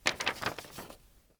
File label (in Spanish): MOV PAPELES; yesterday origins gamerip 2016 switch ps4 pc ios macos